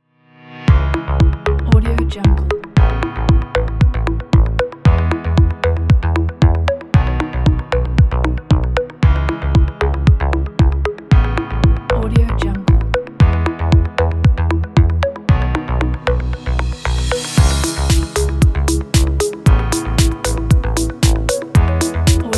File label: corporate technology pack